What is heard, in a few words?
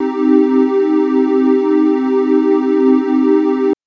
Synth TrianglePad min c3; TrashLibrary